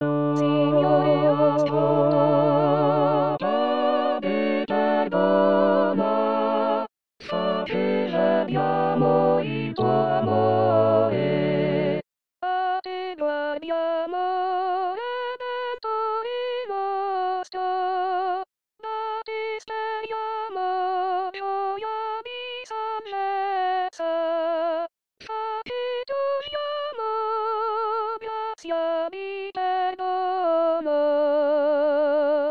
Coro

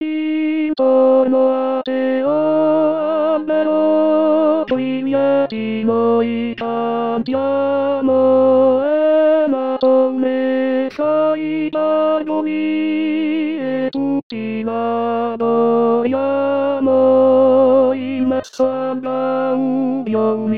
O Tannenbaum Tenori; natale

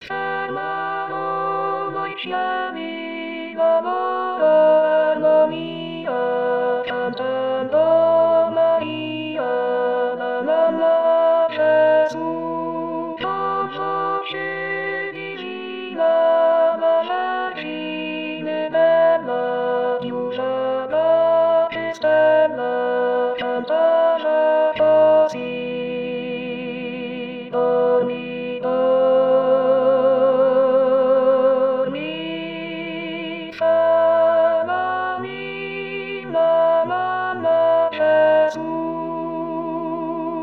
Contralti; Fermarono i Cieli; S Alfonso; natale

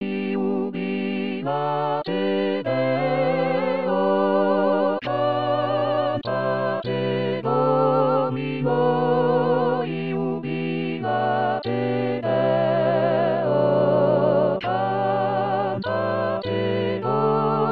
Jubilate Deo Coro

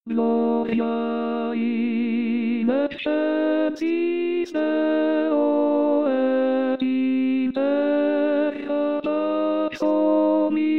Gloria Ritornello Contralti; messe

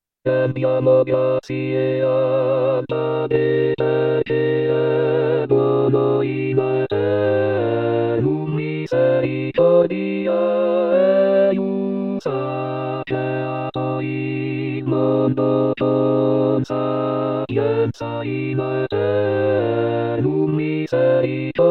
Misericordes Strofe coro; anno liturgico

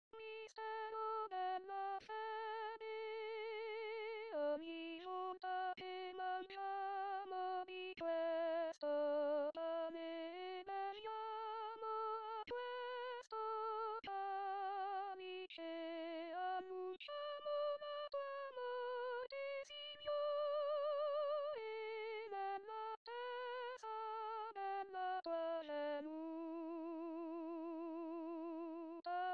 Mistero Della Fede Contralti; anno liturgico

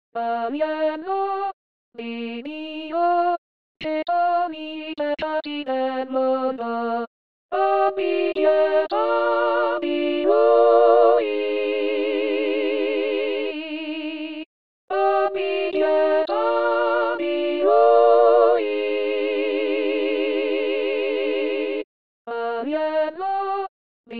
Agnello di Dio Coro; Auricchio; anno liturgico

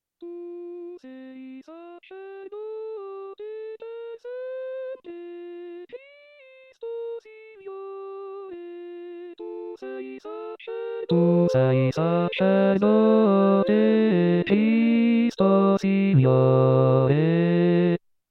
Bassi

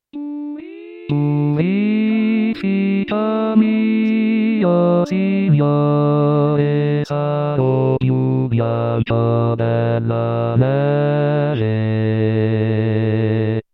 Purificami Rit bassi; anno liturgico

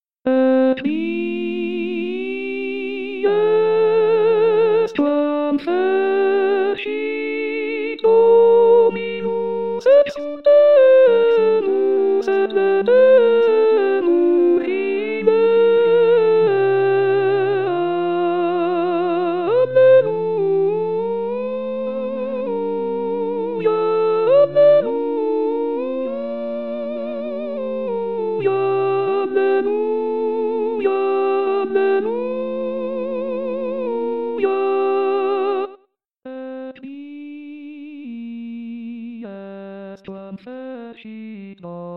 Soprani; Haec Dies; pasqua

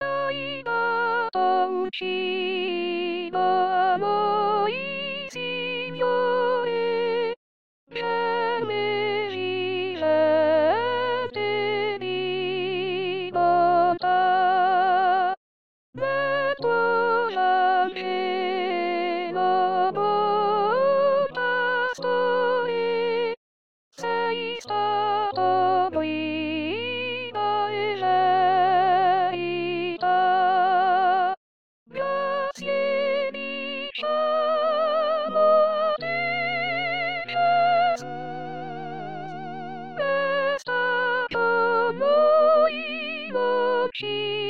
Soprani

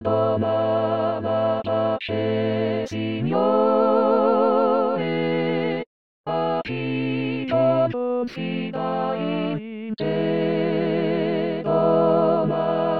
Dona La Pace Coro

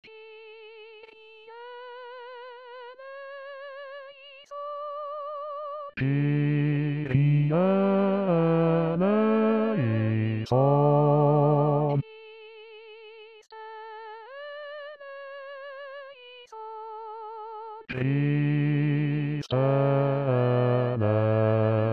Kyrie Bassi; Decha

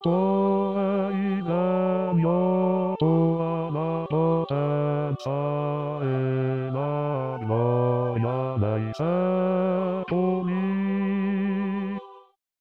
Tuo E il Regno Bassi

